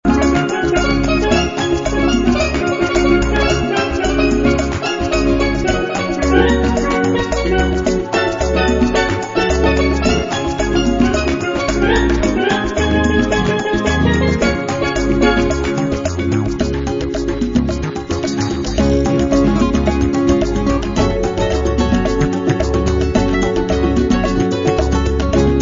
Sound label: Brazilian Samba